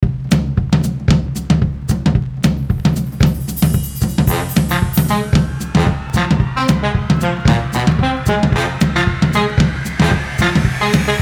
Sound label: Electro Swing Short Version